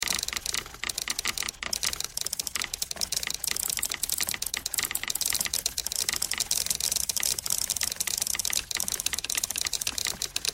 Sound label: typewriter thread simple all